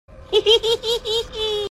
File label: funny hehehe